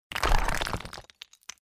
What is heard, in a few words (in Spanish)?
golpea piedra